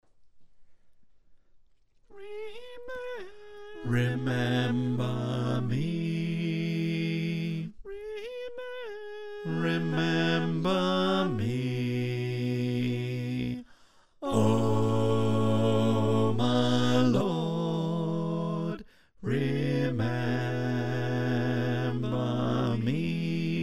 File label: Bass, choir